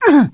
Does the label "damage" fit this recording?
Yes